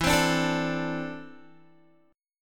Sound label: E 7th Suspended 4th Sharp 5th, Guitar; E7sus4#5; strummed; 12,15,12,14,14,x